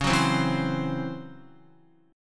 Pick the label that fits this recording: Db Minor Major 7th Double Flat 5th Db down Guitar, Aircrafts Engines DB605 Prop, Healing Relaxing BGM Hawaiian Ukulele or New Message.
Db Minor Major 7th Double Flat 5th Db down Guitar